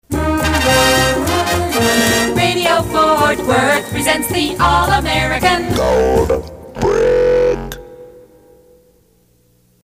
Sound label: Scoreboard; KFJZ; PAMS